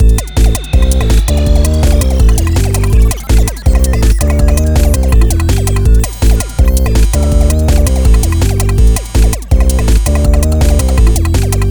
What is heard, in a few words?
FAILED